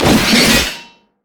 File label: axe grab; Darius